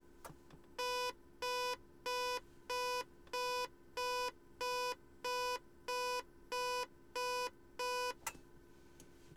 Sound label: Beechcraft King Air 350, Landing Gear Warning Test, INTERIOR; 350i 2010; AMBEO BLD; Pole Position Production